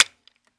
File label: glock click